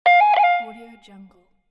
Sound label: incoming message